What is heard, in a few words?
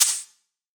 Shaker